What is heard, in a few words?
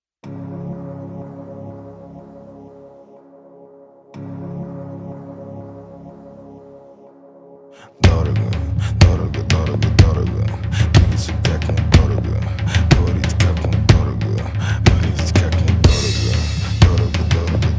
Drum cover